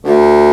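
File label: Contrabassoon mf D1; Digital Sound Factory Virtuoso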